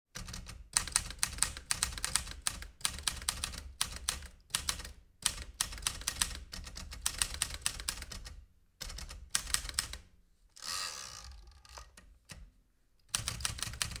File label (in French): Machine a ecrire